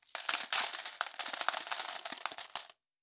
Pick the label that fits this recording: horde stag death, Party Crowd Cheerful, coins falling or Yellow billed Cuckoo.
coins falling